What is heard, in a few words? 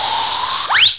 pickup